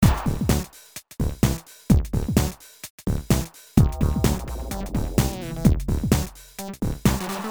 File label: Loop